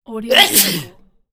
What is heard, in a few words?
sneeze; fx library